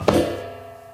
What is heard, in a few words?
metal grate, footsteps